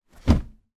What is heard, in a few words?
household mattress drop down on bed frame